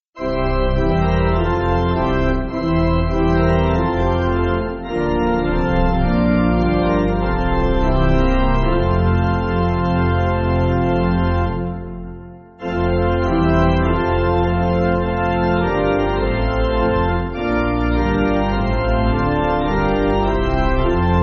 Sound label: Pipe Organ; Martin Madan 8.8.8.8.8